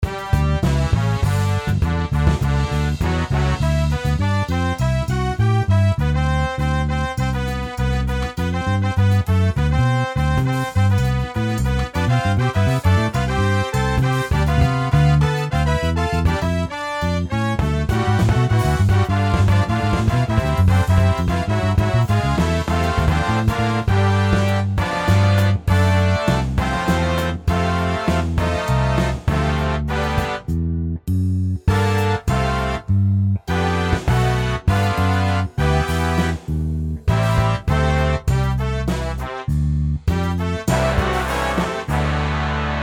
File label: brass; NewYork